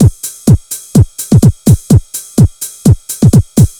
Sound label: LOOP; SoundFactory; DRUMLOOP